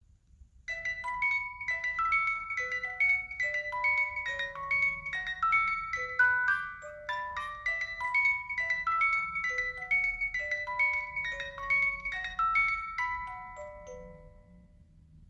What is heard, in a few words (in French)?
18 lames, boite musique manivelles